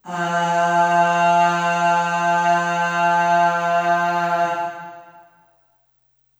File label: Aah Long F2 Short Reverb; Harmonic Building Blocks